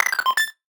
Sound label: interact, Defensebot